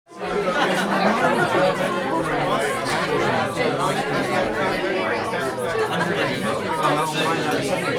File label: people; SoundFactory; FX